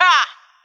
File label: "ha", Voice